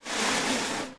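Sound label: metal slide medium; objects; Star Wars Obi-Wan; XBOX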